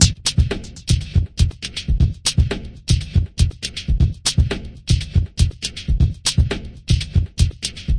120 bpm, muted and bright floppy echo, drum loops and breaks